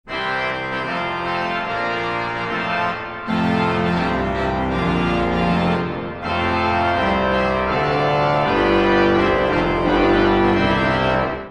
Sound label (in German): vergleich zungen, orgel